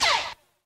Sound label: Gabber Hit